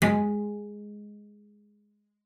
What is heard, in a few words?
CELLO FINGER G#3; 90 s Sample CDs; Xsample VOL-5